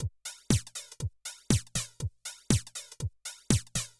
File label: House Drum Flanger